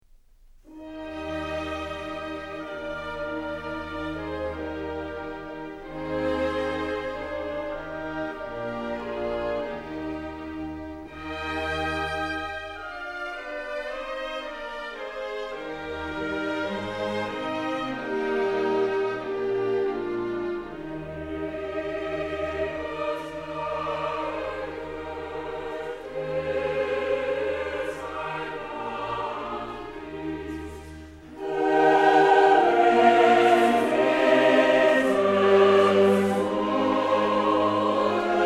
Choir; Franz Schubert; Classique

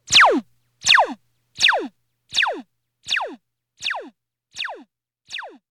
laser

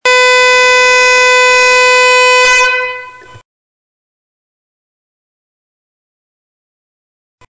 air horn blast; Horns

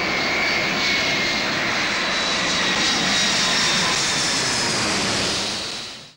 takeoff; sample pour scratch

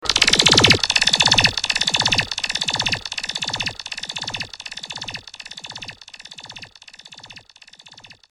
laser

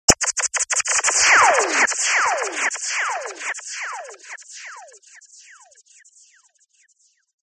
Laser Crazy Shots

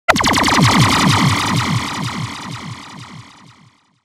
Laser Crazy Shots

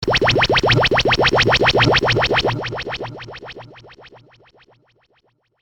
gunshot

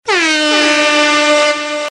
foghorn

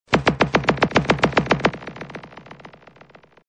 lickshot; Laser

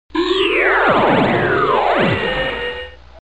Lazer; Laser; Superfriends

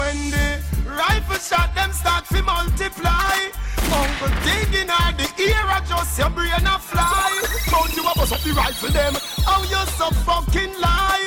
rifle shot, DanceHall

